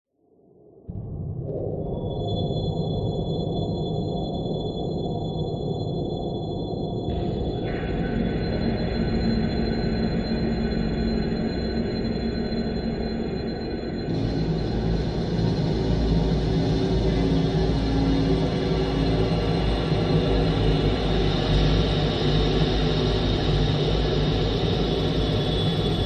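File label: science fiction atmosphere spacious haunting droning airy with eerie shrill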